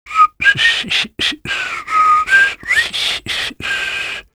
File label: fish whistling, fisherman; lostcoast